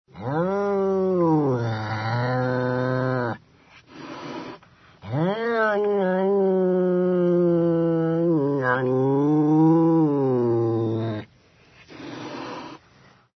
ANIMAL CAT

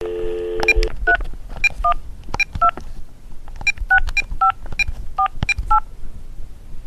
dialing phone number

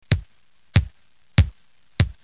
basketball dribbling

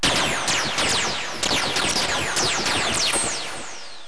scifi

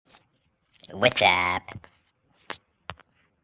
whatsapp

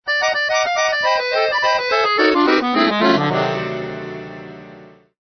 ACCORDION

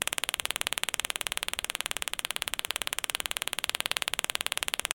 taser

